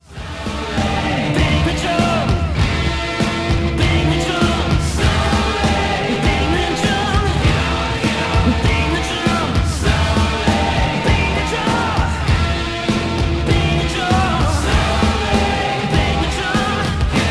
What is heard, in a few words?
Bang the drum; warp drive